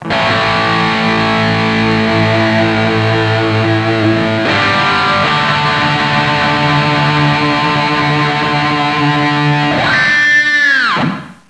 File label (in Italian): suonerie musica rock